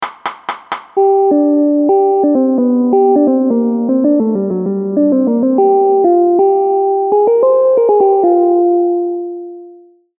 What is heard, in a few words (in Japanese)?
フレーズ; コピー